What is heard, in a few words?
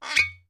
Cork Pops & Squeaks; Squeaks